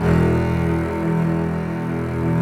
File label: CURT CELL; AKAI S-Series CD-ROM Sound Library VOL-7; CELLO+VIOLIN